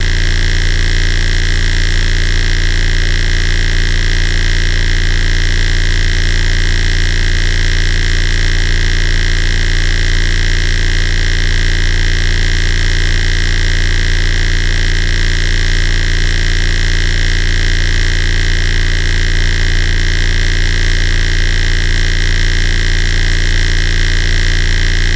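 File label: Neon Light Transformer, buzz & hums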